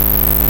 Bass

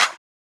clap

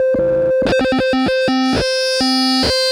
Raw Glitch

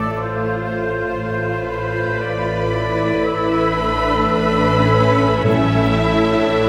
PRELUDIUM General Ensemble

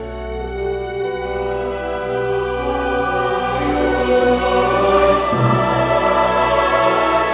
Chorus

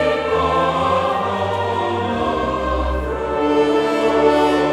Chorus; Chorus Of The Oppressed

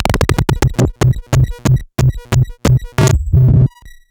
Raw Glitch

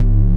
Bass

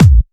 vee bassdrum

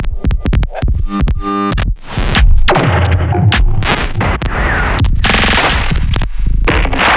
Glitch Loop

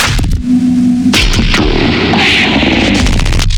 Glitch Loop

